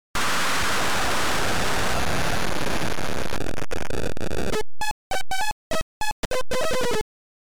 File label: Title Beeper; Cobra